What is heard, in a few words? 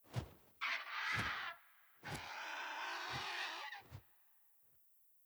Vulture Overhead Fly By; The Town; 3D Environment